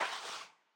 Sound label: win Presentation Animate Card Out; arcane